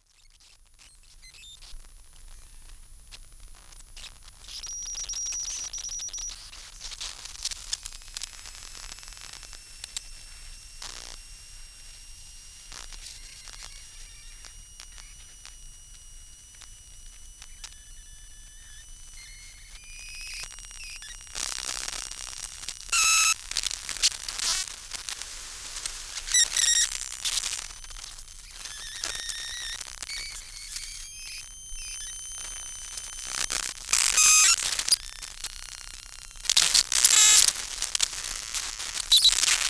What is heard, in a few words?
Electromagnetic compatibility; AUSTRALIA; Les Cartes Postales Sonores; NOISE ON EARTH Vol7